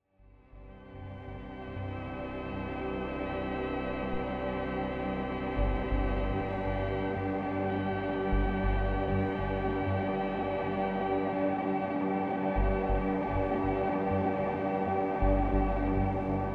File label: Drumor